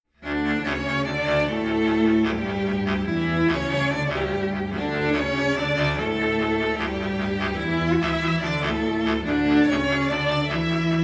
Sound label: bass clarinet and string quartet